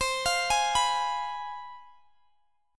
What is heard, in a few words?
C Major 7th Suspended 4th Sharp 5th, down; CM7sus4#5 arpeggiated